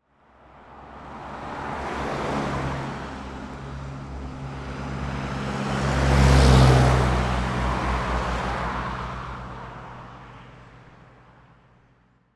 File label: transport van pass followed by truck followed by car